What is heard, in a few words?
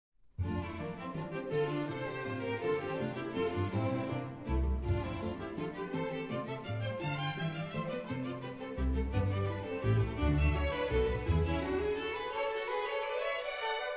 concertino for flute and string orchestra